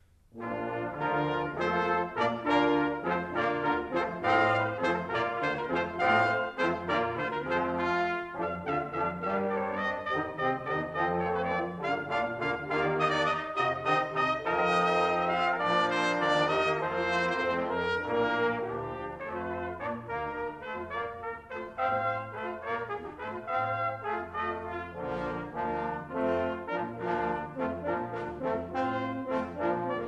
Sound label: Alla Hornpipe, Brass and Percussion Ensemble; Suite No 2 in D Major